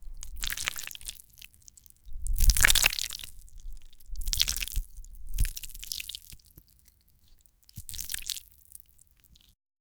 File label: sticky sushi rice being, cooking